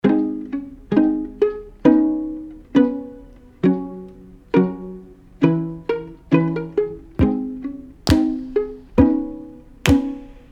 low fiddle mix

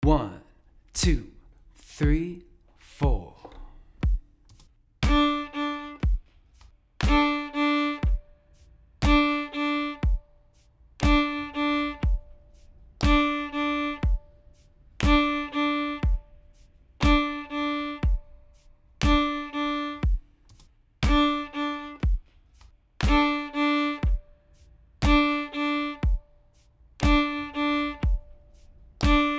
offbeat accents eighth pairs open d, fiddle; offbeat accent patterns

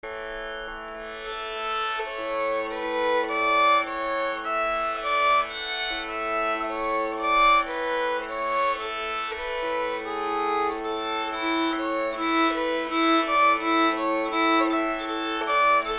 pedal exercise or tune idea, fiddle

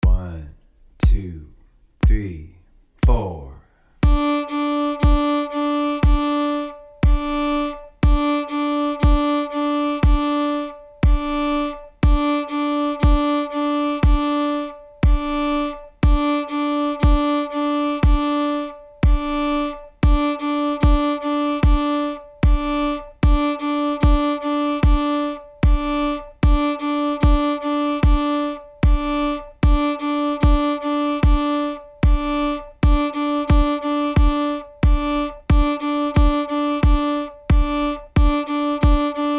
tucka D, fiddle

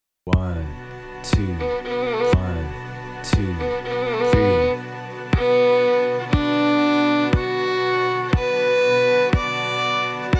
minor triads B Minor Single Bows, fiddle; scale central

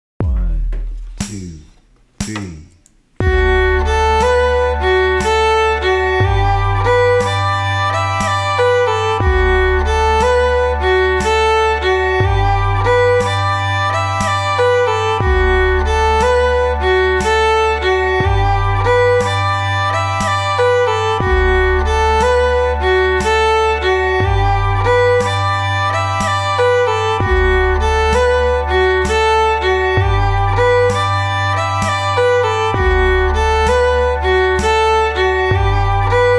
loop, fiddle; The Snowy Path; irish fiddle lessons

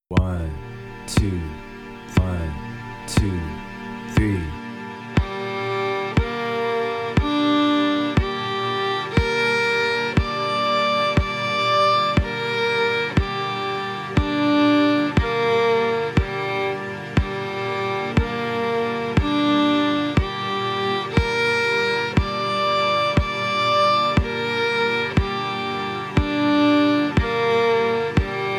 minor triads G Minor Single Bows, fiddle; scale central

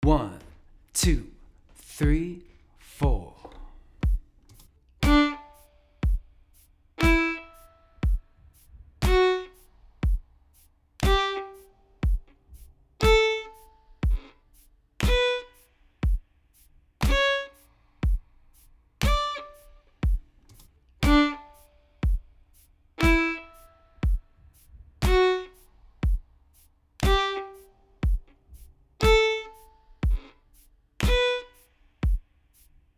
offbeat accents quarter notes d major, fiddle; offbeat accent patterns

